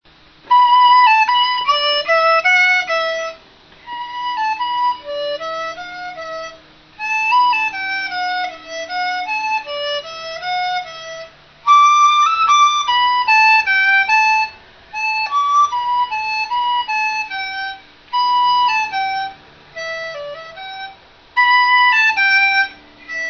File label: dizi